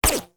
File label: bullet fly by